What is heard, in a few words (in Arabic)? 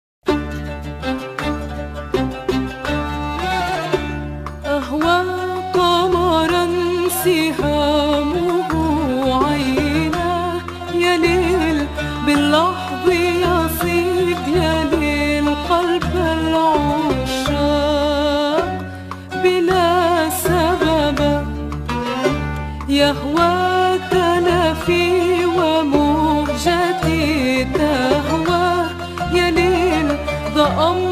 موشح اندلسي Andalusian Arabic